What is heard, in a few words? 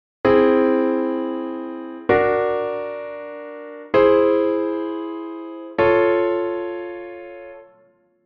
aug chord sound